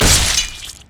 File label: dragonstrike; LoL; jarvaniv